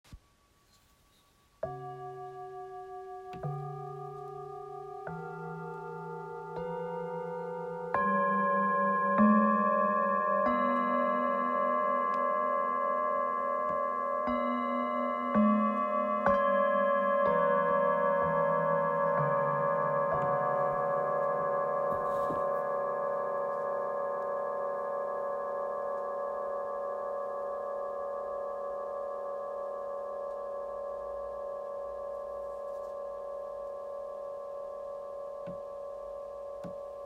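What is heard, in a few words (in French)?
Set, bols chantants tibétains; sensoriel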